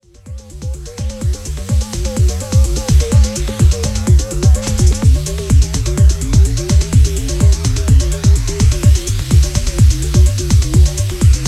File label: methane bass